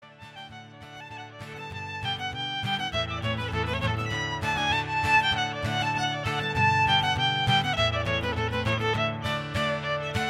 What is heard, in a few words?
Reel Fiddle Guitar; St. Anne's Reel; Earwigs